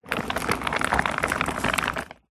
household vacuum cleaner roll on tiled floor while off